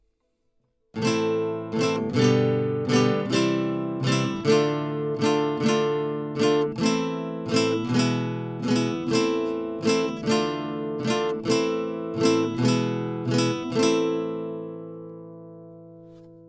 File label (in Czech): Kytara Live